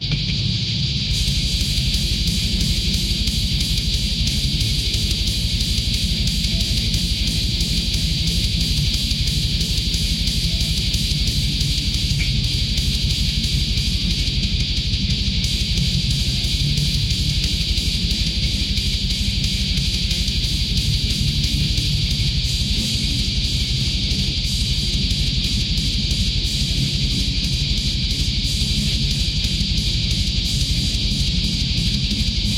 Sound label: heavy metal thrash headbanging concert promotion shredding guitar aggressive 180 bpm